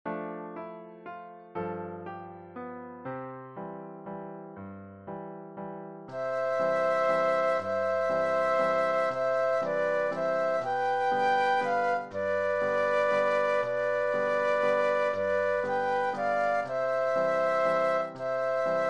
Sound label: Flute Duet and Piano